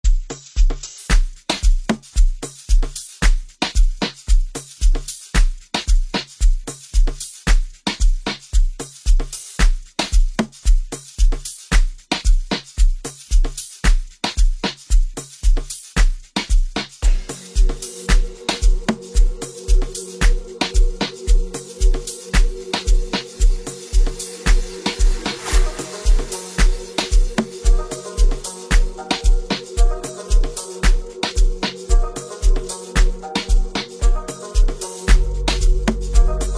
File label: Bass Waves